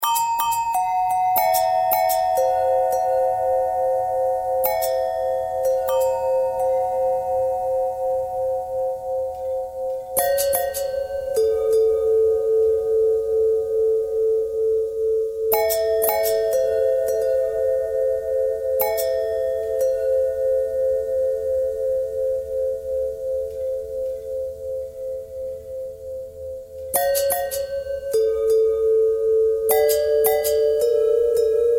long bell long bell threads, bells

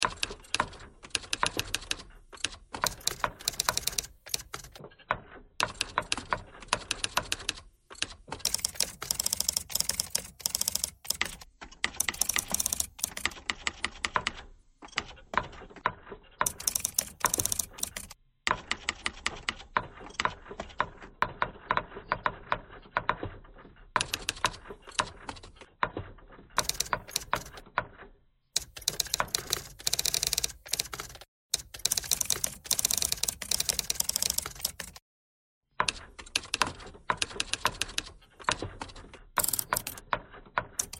typewriter thread simple

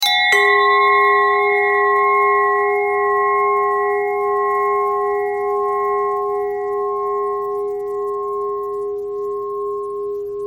bell thread pentatonic

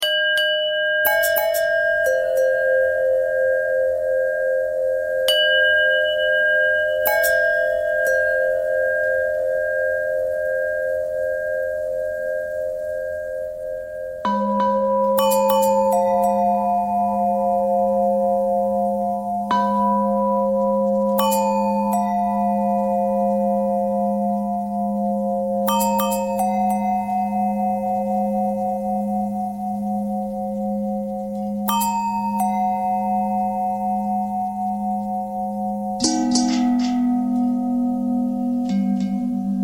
long bell bell threads, bells

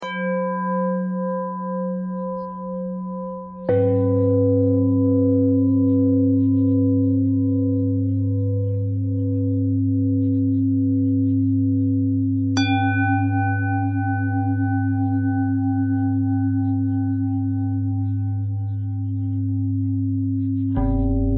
bell bell thread simple, bells

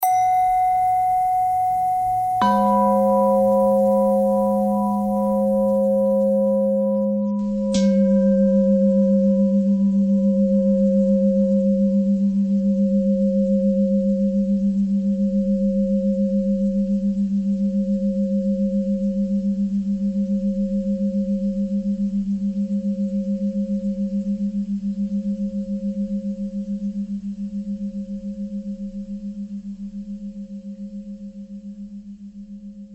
bell threads

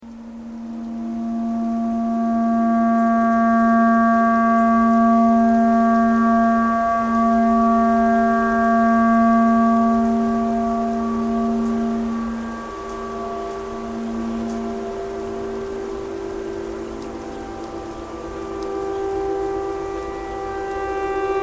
clarinet tornado siren noise

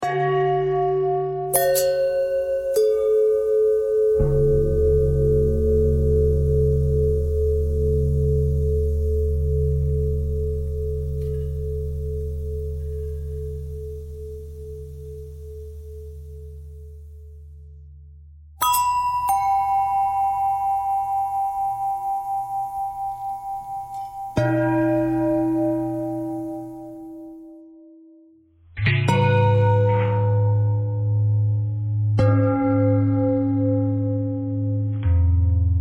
long bell bell bend threads

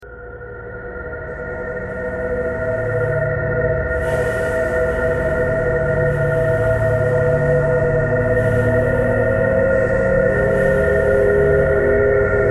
thread buzz

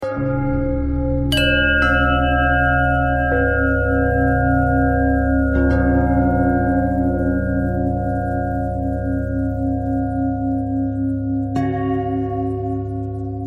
bell bell thread simple, bells

